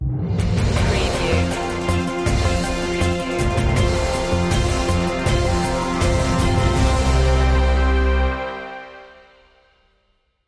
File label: informative news stinger